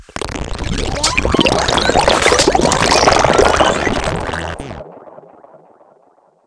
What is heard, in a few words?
death skull tree sounds